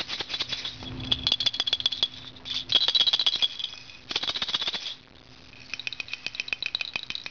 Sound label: shake and play educational set; percussion; nino